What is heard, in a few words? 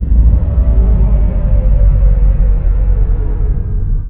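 Landing Ship land; Star Wars Clone Wars; XBOX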